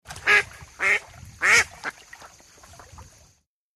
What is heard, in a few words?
Drake Quacking Water, ducks